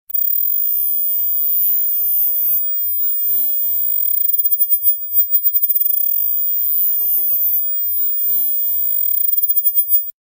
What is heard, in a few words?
Radar